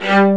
Spicato Basses & Celli

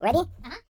YODEL YELL, World Voices

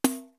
R8 PERC; Greytsounds VOL-1

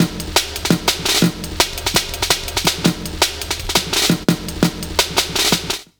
LOOP, Explosive Jungle

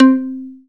MARIMBA

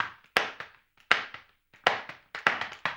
HAMBONE, Vocal; Blues & Soul

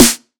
909 Snr Sweet, Tape 909, SD

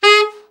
BRA TENOR SN, SAX

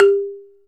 Balafon G#3; Planet Earth; Digital Sound Factory Planet Earth

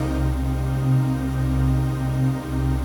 PAD, Dance Mega Synth

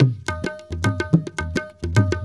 India, Heart of Asia

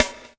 SD, Drum & Bass, 160 BPM

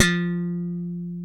F-0 SLAP, SLAP BASS; AKAI S-Series CD-ROM Sound Library VOL-7; SLAP BASS 1

